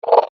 Robotic Insect Droid, science fiction sound effect